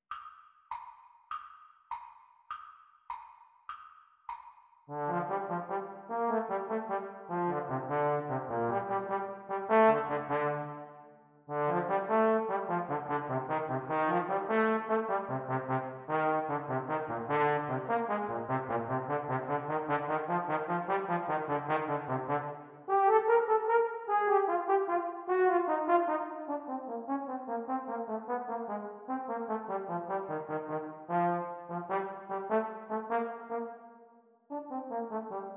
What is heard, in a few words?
Playalong for Eb Horn Exam Speed; Eb Tenor Horn duets; The Teacher part